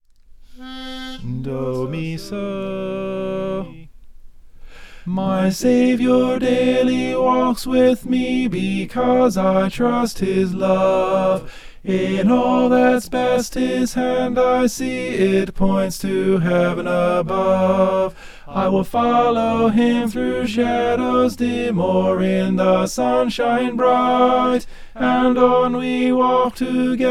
on and on we walk tenor

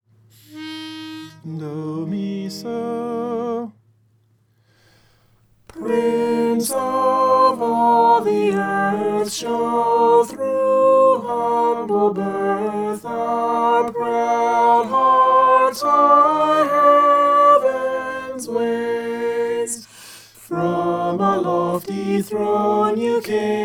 prince of all the earth soprano

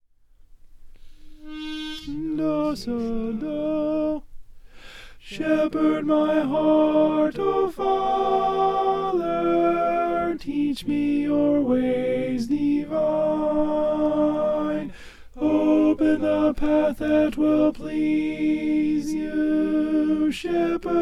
shepherd my heart alto

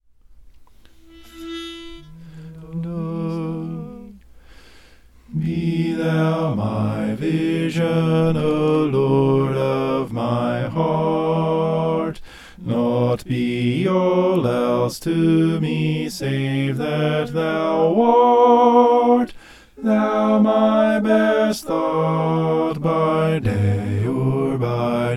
bass

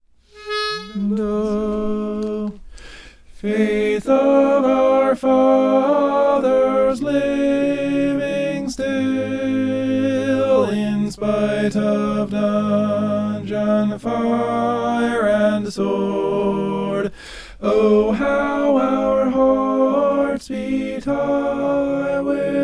tenor; faith of our fathers

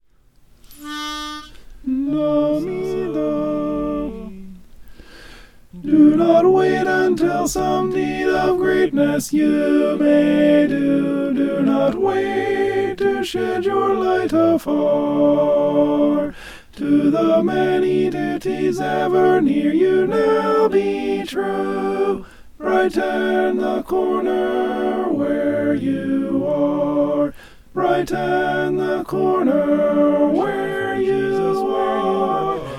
brighten the corner alto

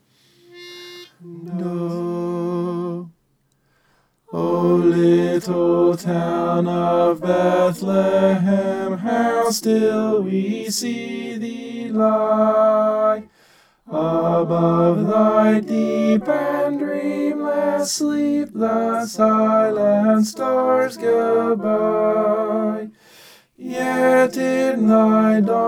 tenor

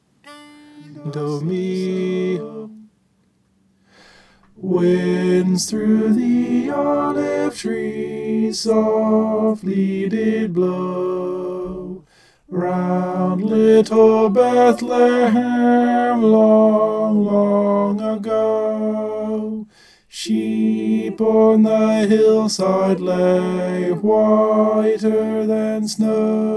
tenor

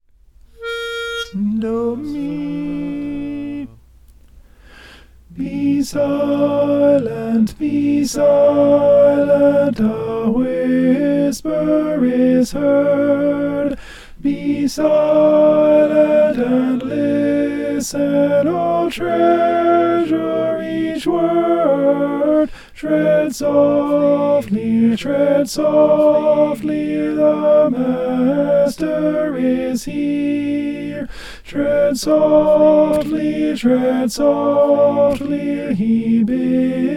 be silent alto

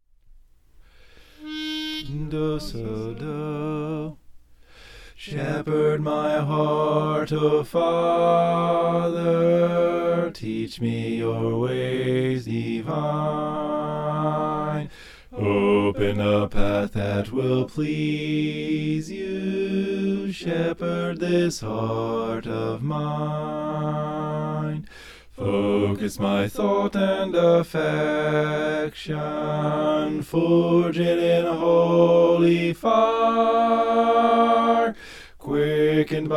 shepherd my heart bass

